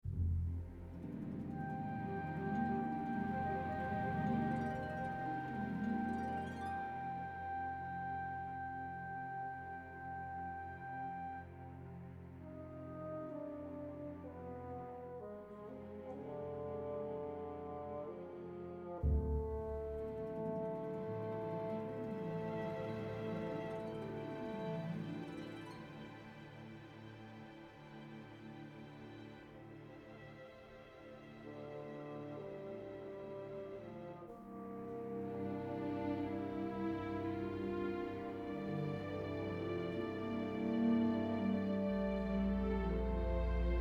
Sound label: calm, music; minecraft; TBMPack